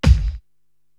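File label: BD, bass drum, drum machine; Korg TR-Rack; Scab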